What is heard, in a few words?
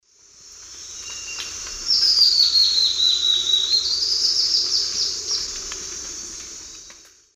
Sclerurus rufigularis, song; S. America; Eastern Lowlands